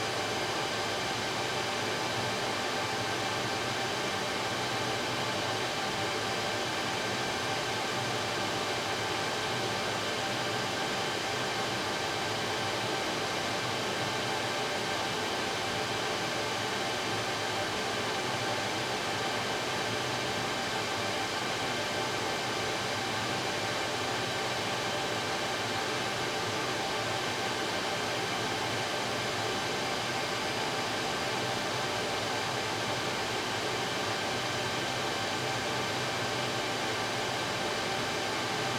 Ambience, Room Tone, Server Room, Data Center, Electronic Whirr and Hum, Air Condition, HVAC; DeltaVR; FMOD